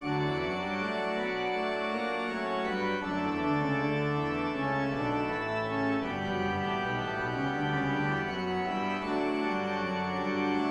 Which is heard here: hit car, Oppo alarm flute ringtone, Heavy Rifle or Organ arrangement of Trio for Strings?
Organ arrangement of Trio for Strings